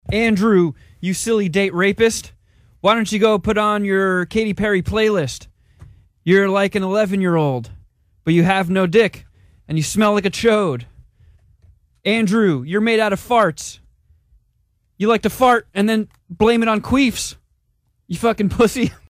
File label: rap, dry